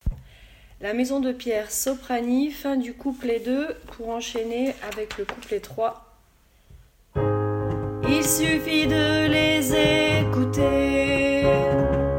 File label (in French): Paroles Soprane; pupitre soprani; enregistrements de travail